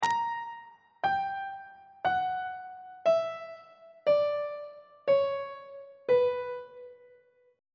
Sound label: b harmonic minor scale descending on treble clef